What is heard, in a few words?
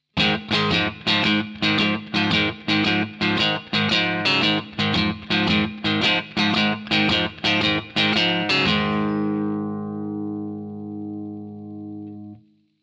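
shuffle staccato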